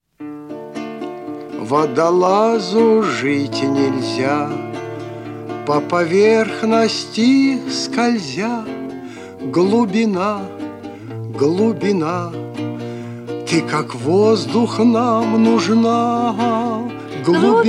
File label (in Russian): detskie pesni